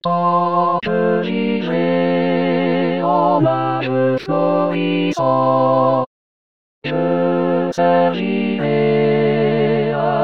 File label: tutti; rimailleurs